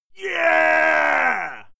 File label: male fight scream yeah; menschen; schreie